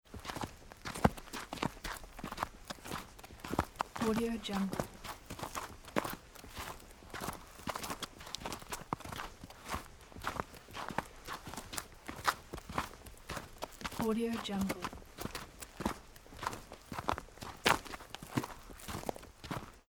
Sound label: two people forest winter footsteps on hard surface